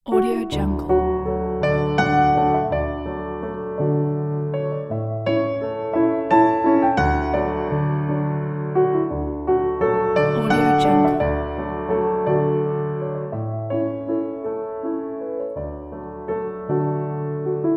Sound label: cheerful classical piano waltz